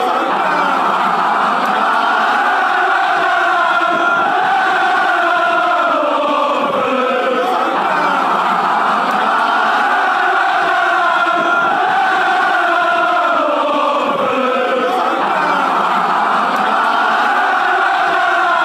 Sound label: la la la lalalalalala lalala watford; watfordd